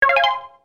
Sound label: REDCOIN; YWW